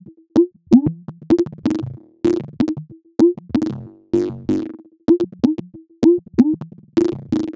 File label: Picollo 127bpm, House Drumloops; 90 s Sample CDs; Zero-G House Fabrik Drumloops; ZG Drumloops